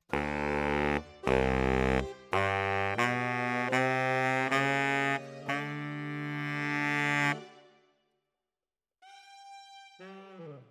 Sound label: Bari, RNR for piano